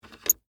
Metal Handle Up, Foley, Metal, Handle, Up, Squeak; Machinery Sound Effects; Machines

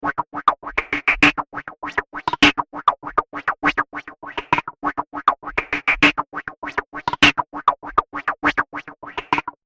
Drum Loop 100 Bpm; Chaos Wisp; Royalty Free Drum Loops; Percussive And Rhythmic; Seamless loop

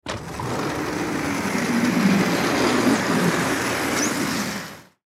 Wall Divider Slide Open; Office; Blastwave

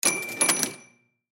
Pinball Bumper, Pinball Bumper Hit Ball Ding; High Pitched; Sports; Arcade Sound Effects; Blastwave

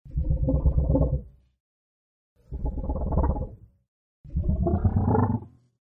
Low Pitched Cartoony Wobbling Effect-3 Takes; Cartoony Effect Wobble Low; Cartoon Sound Design Sound Effects

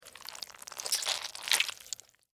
Worm, Crawl, Movement, Slimy, Wet, Insect, Bug; Blastwave; Animals:Insects

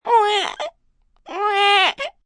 Cry Baby Wah, Cry, baby, short; Crying Sound Effects; VoiceBox; Twistedtracks

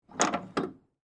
Handle Squeak, Handle, Squeak, Metal; Foley; Hand Tools

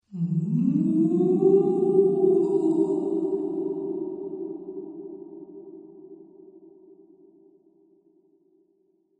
Ghostly Moan; Ghost Sounds; Horror

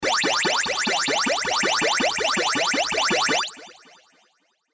Sci Fi Ascends Synth

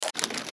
button scrape; Multimedia; Blastwave

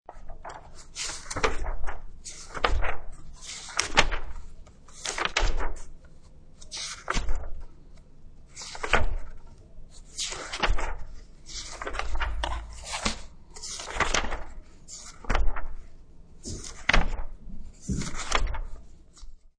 Flpng Paper, Flipping through a pile of paper.; Classroom; Schools

